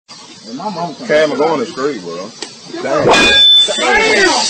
metal hit; tiktok

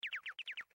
run; keronian

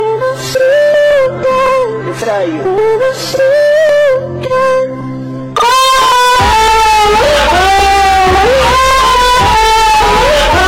sad music

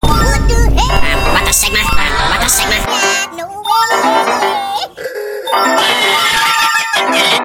some goofy ahh ringtone

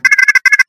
beep machine

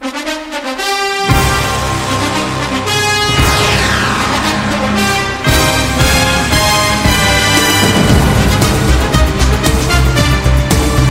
theme reverb; nfl

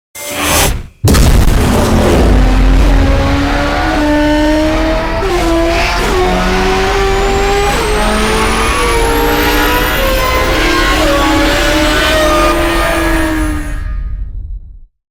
turbo; nfs hp2010

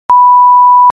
radio time check beep; vmpc radio

